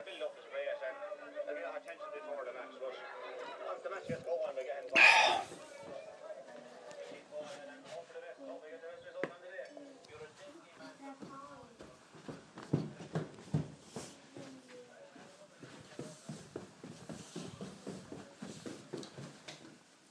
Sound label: monday morning soundscape; low volume